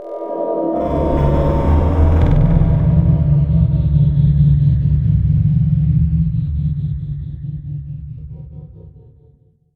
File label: garg search; slender